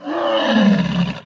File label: Hellephant Death; UnityShootingGame